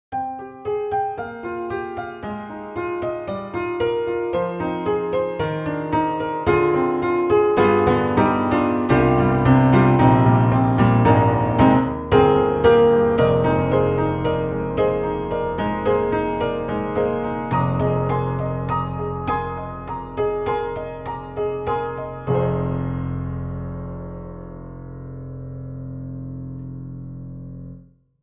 Upright Pianos Vintage Upright Vintage Blue Note; Synthogy; Ivory II

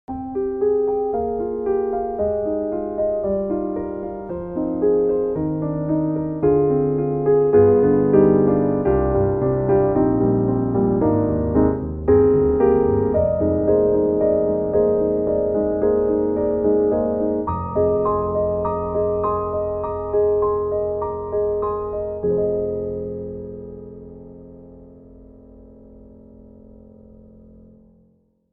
CFX concert grand, contemporary; GARRITAN; Newman Beauty

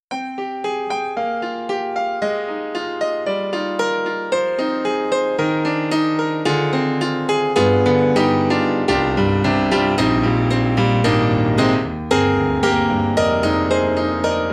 KORG KRONOS 2 Piano

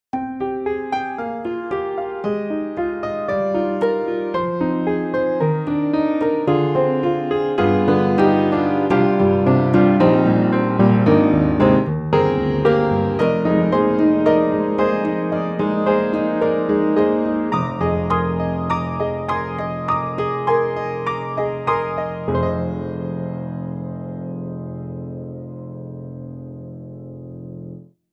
Upright Dark Indie; Spectrasonics; Keyscape; Wing

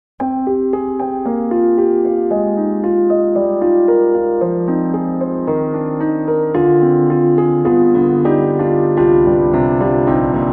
Rosewood Grand Heartfelt; Orange Tree Samples; Evolution Rosewood Grand

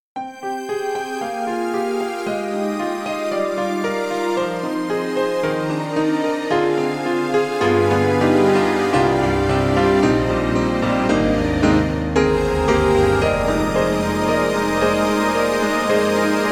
KORG KRONOS 2 Piano and Orchestra; Berlin

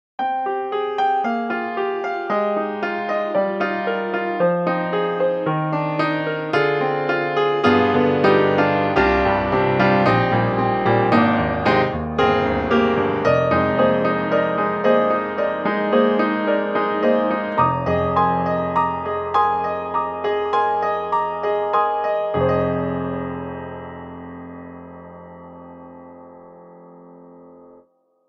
The Big Grand Cavern; VI ONE

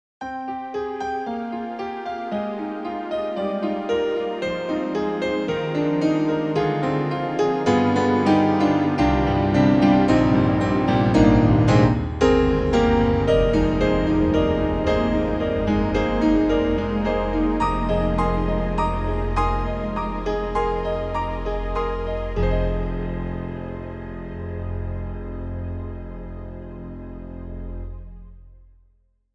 YAMAHA MOTIF-RACK XS, Piano Back

